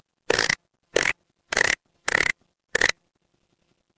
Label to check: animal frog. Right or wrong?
Right